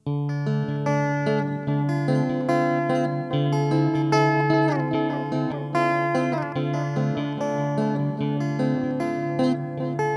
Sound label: ping pong, delay; tc electronic nova